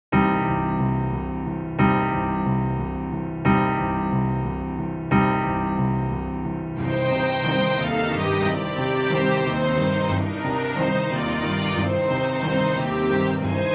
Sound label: boss battle